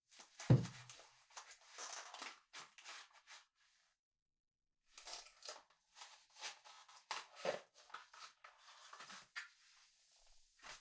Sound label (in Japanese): パンプスを脱ぐ; 3DIO; 色々な動作